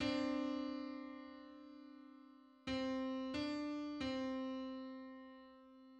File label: Two-hundred-eighty-ninth harmonic on C